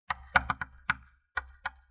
wood door knock other side two bits

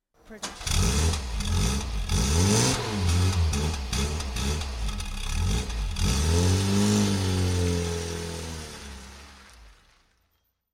car start drive off distance

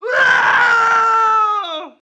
man scream fear; soundscrate

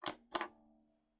TV Button Press; SoundsCrate

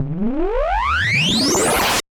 Rotor Charge Up; SoundsCrate

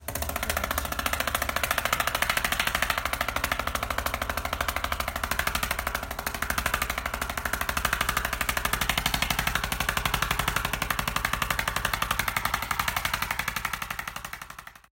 helicopter; soundscrate

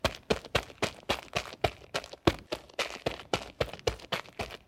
loopable running on dirt floor; soundscrate

